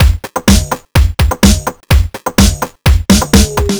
Fill, Drum Loops 126bpm, Nu Dance Electro; Zero-G; Soundclash Nu Dance Electro; Acidized Wavs; Nitty Gritty

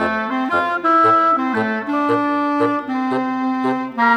Winds, Orchestral, Rock Pop; Sonic Foundry (Sony Creative Software); Orchestral Series VOL-4 - Rock and Pop

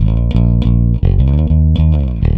Rock, Bass; Bass X

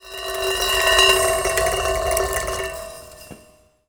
Prepared Drums; Drum Kit Samples; Sonic Foundry (Sony Creative Software); The Electronic Music Manuscript Collection; Battery 3 Sample Kits

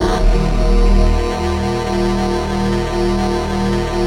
synth clav; Best Service ProSamples vol.55 - Retro Sampler; AKAI

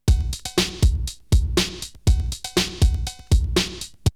Drumloop; ZERO G - Datafile DISC 1

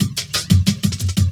LOOP, Drum & Bass, 180 BPM; Ueberschall; Drum & Bass Resonance

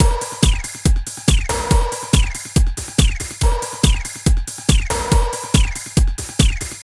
Drum Loops

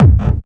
KICK hardcore, Techno Trance DRUMS

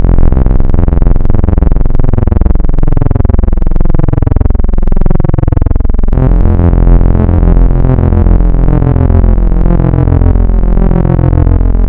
Spectral, wavetables; Architecture 32

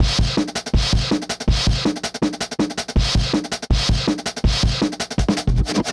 Exh Notes 162, drums loops; RoBKTA Sample Pack Supreme

